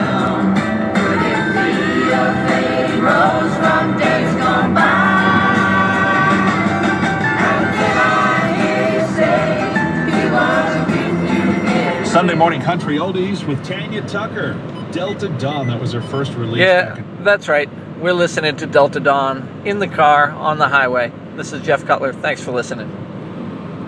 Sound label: singing along with the radio